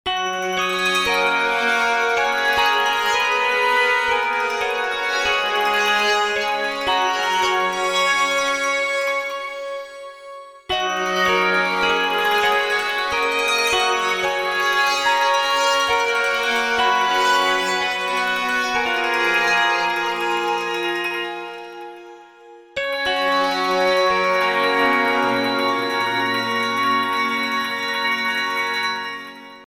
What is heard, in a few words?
contrails, alarms